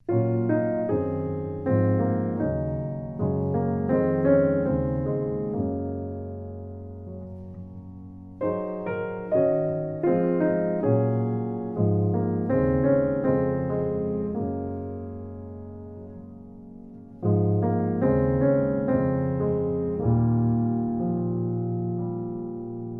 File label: Solo Piano